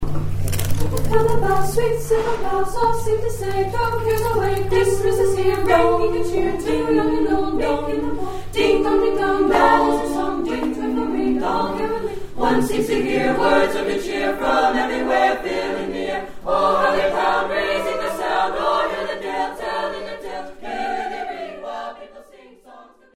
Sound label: choir; rotary